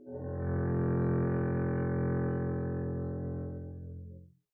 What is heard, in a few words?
arco f1 p up, double bass